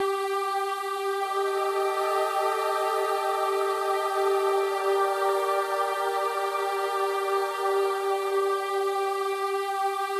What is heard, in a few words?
sounds music; Halo Combat Evolved; Xbox